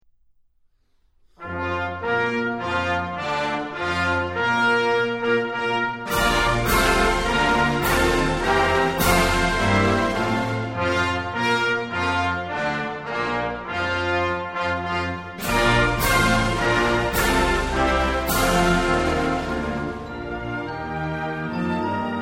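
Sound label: anthem, Australia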